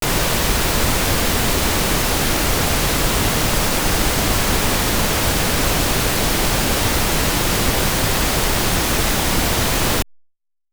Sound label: pink noise 48kHz